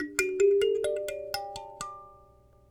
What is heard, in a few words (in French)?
Piano Pouce Gamme Ascendante; Stereo